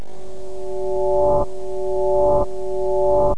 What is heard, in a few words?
chime rev; autodesk animator